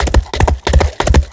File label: running; lighthouse pluton; lighthousemonster